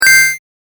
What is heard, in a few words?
game effect